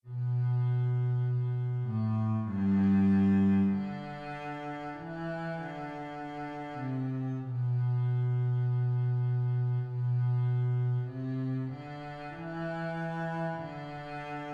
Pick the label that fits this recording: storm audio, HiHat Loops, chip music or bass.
bass